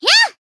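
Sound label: Voice attack, Japanese; thillelille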